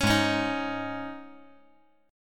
G sharp Suspended 4th G sharp

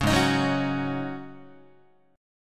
G sharp 7th Suspended 4th G sharp, Guitar; G#7sus4 strummed; JGuitar

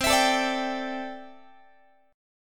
C 6th Add 9th, C, down, Guitar; strummed; 4A,4E,5A,5D,5G#,6C#; chord; JGuitar

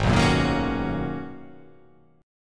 G sharp Minor 11th G sharp, chord

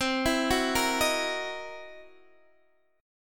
C 7th Sharp 9th Flat 5th C Guitar Open D

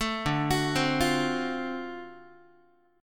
D 7th Sharp 9th, Guitar, chord; D7#9 arpeggiated; 12,10,9,10,9,x; JGuitar

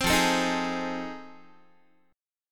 F 7th Sharp 9th, Guitar; F7#9 strummed

